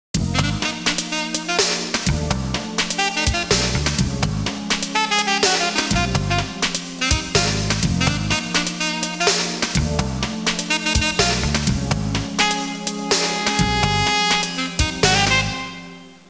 musica urban

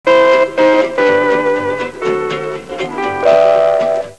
musica strumentale

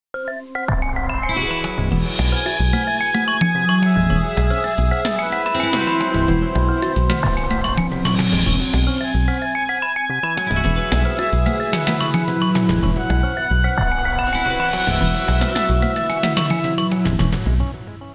musica elettronica

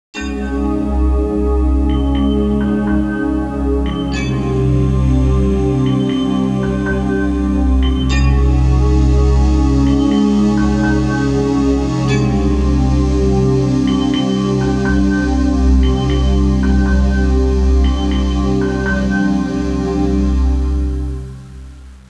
musica newage

